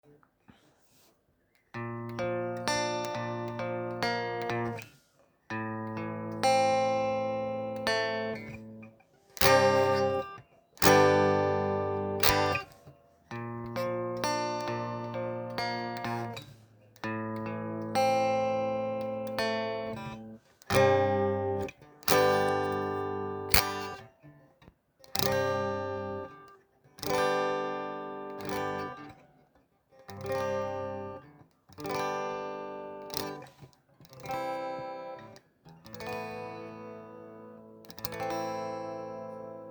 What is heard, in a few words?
Bb Am Gsus4 G C Asus2 Am Asus4 C